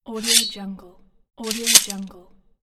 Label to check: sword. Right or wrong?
Right